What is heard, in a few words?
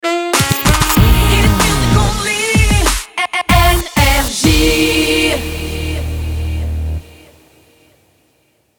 Jingle Shotgun; NRJ